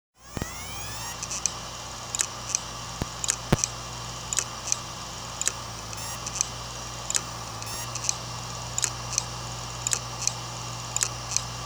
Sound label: Western Digital Caviar Blue 1TB